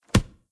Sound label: Book; blue archive